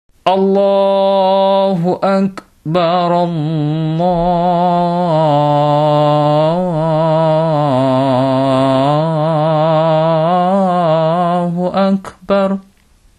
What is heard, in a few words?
Adzan